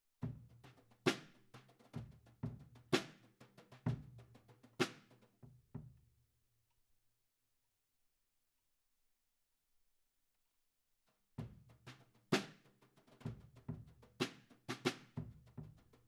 Kit, 96bpm

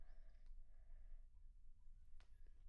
Children Choir; Studio Bekol Children Choir

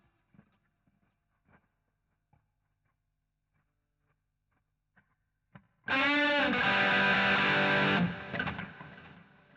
GTR A Solo

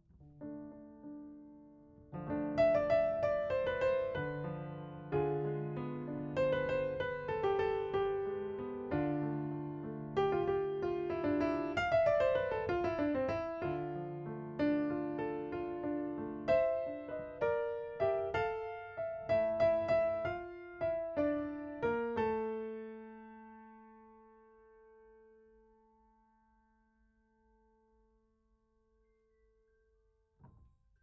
Piano A

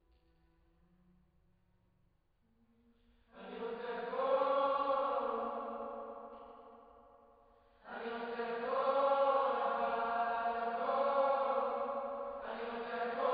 Choir

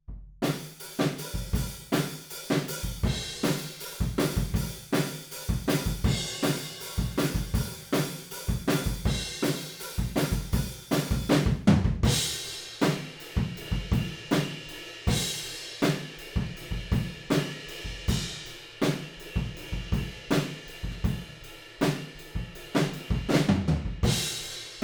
Room, 160 BPM

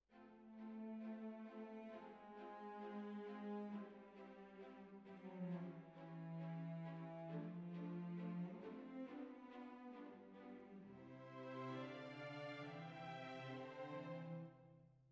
Clarinet

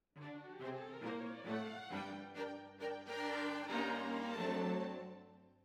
Cellos Over

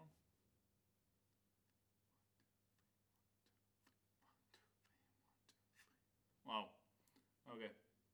Ride

